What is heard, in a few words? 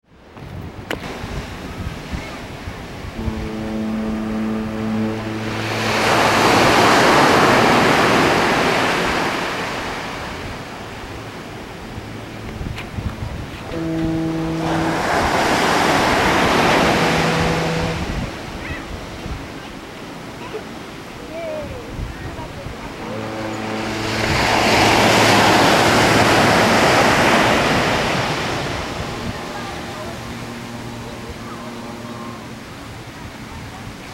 chinabeach